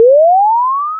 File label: chirp